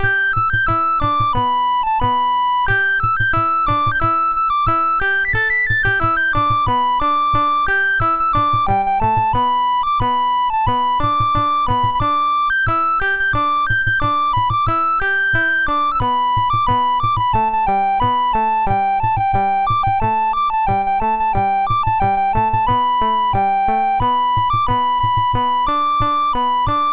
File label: Chinese Folk Song, MOS6581R2